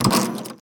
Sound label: inserting key into wooden door